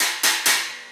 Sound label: Cymbal Pattern